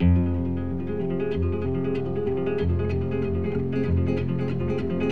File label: guitar loop, Sad